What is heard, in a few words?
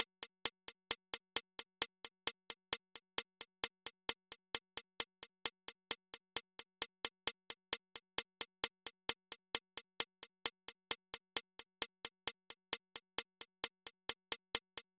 Constant Hats